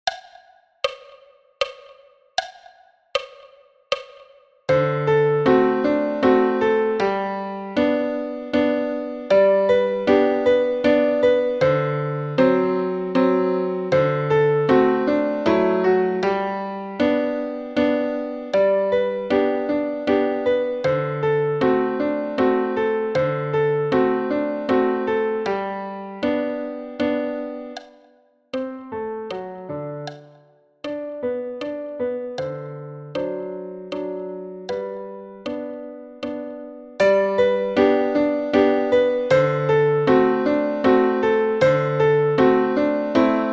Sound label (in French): Danse autrichienne Piano a 78 bpm